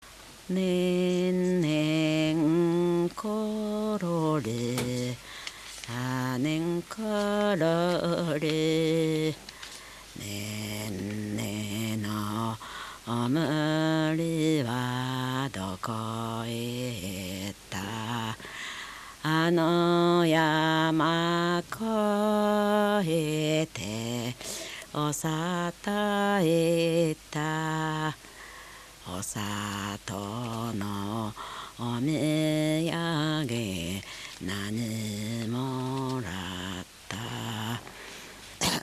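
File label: lullaby; 子守唄; 子守歌; 市原市; 養老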